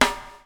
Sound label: bubble; Spectrasonics - Backbeat